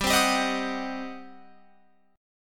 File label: G Major 7th Suspended 4th Sharp 5th, G, down, Guitar, Open F; GM7sus4#5; strummed